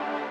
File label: lead